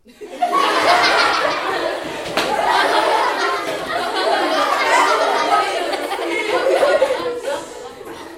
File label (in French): rires